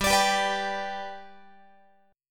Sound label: G Major down Guitar; strummed